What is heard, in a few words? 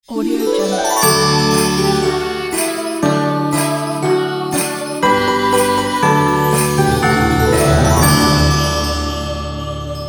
christmas idents pack; crazytunes